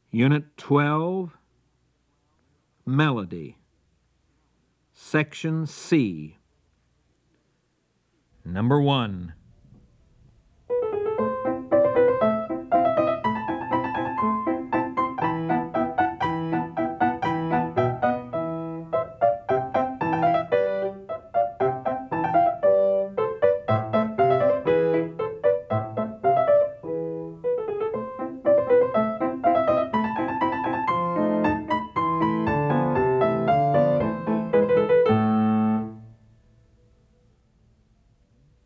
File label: melody, ear training recordings